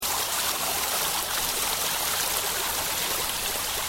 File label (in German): brunnen